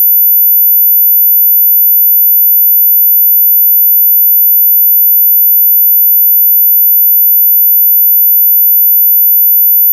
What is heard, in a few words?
sin 14000Hz